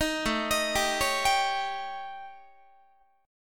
A 7th Flat 5th A down Guitar 4A,4E,5A,5D,5G sharp,6C sharp; A7b5; arpeggiated